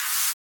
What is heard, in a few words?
White Noise Snare